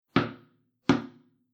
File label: Tennis Bounce And Hit; Tennis Sound Effects; Sports; Andrew Fleming